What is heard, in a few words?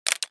Mech Metal Object, machines winches